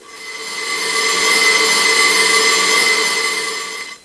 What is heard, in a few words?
Flange Squeal, Trains; PFx Brick